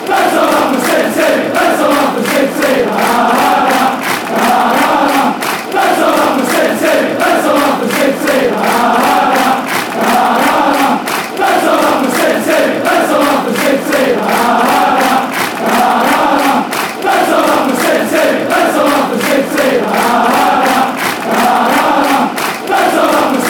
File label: lets all laugh at city